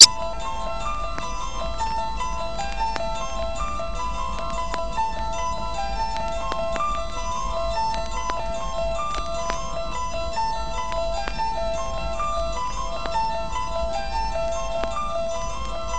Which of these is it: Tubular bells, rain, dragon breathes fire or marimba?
Tubular bells